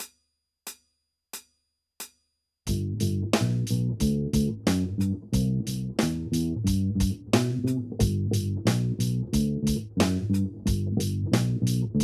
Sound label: Bass